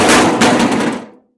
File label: hood impact; Blind Drive